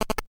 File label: order preparing